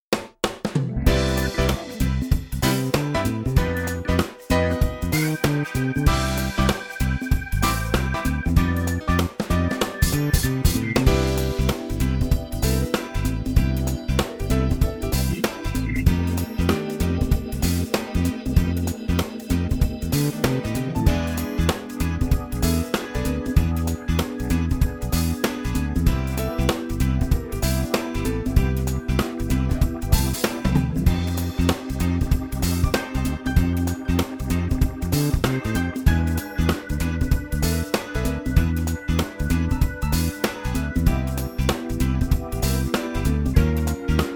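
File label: G, backing track; key - G - vocal range - Eb to E; Summer grooves and great live feel!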